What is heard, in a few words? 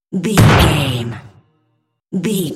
cinematic stab hit trailer